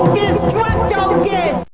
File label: disc